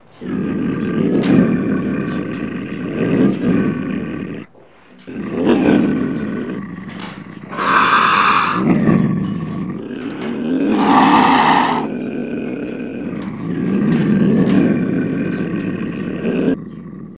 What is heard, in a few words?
Mountain Lion Growl